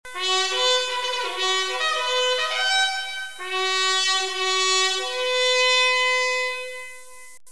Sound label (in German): horn